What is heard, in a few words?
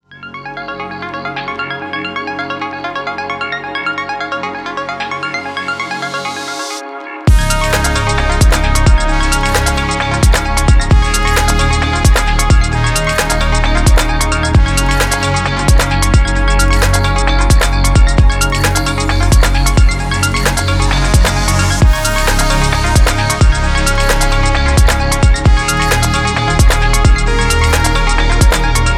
background technology